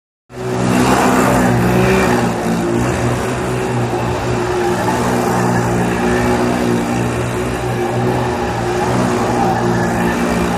Motorcycle; Circles; Honda Trike Round Wall Of Death With Tires Squealing, Slows After A Short While, Stops And Quick Switch Off.; trials bike